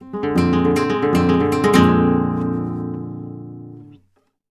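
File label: arpeggio guitar, Flamenco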